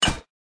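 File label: Hit short range bite molar; The Denpa Men; Android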